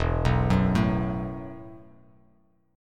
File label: E Suspended 2nd Suspended 4th E, Bass Standard; arpeggiated; JGuitar